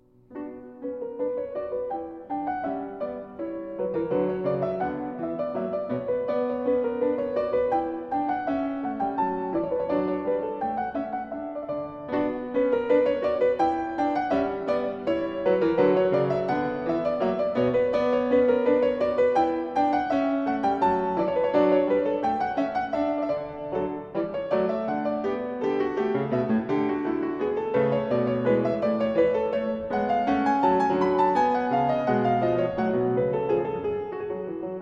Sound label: French Suite Anglaise